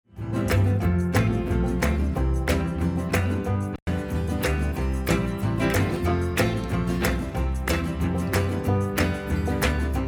Gullfoss Bypassed Jam Band; Recover 30 Tame

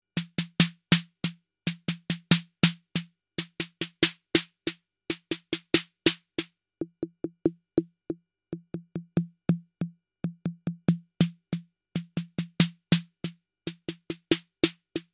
Snare

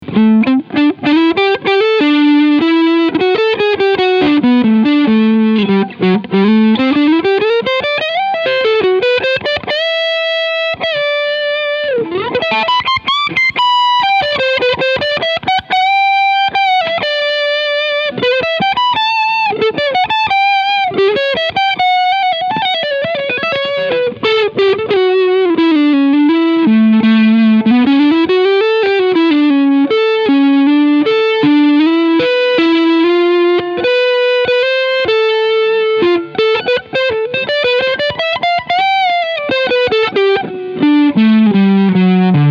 Overdrive Front

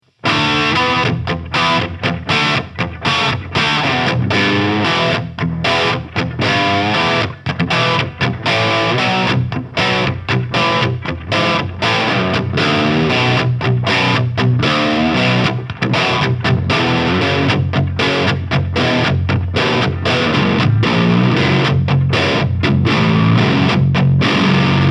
Jackson Soloist 8 Crunch

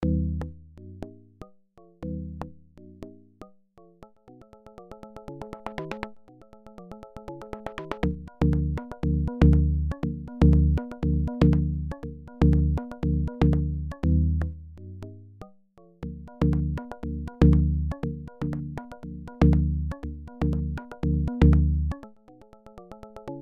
hand drums G Maj

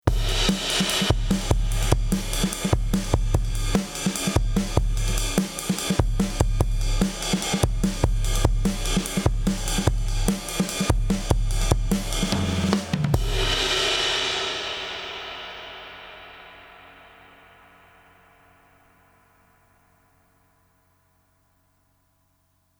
Drumbus Fx comp; Cameleon Labs 7721

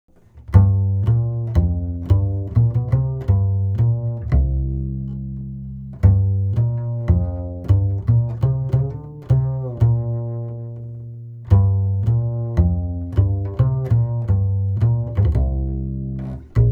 Upright Bass Comp slow; Rupert Neve Designs Portico 5017

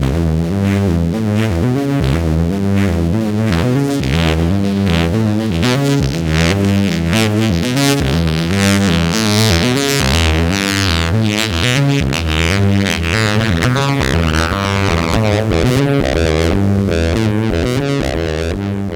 FM Sax; Waves CODEX

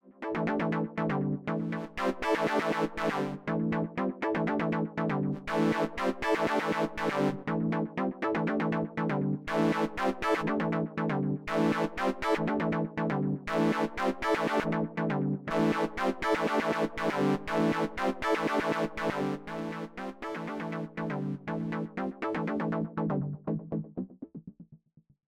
dreadbox Erebus V3 Filter as Cross Fader

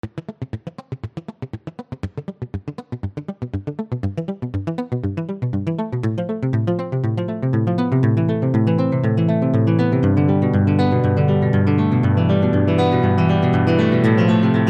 modal

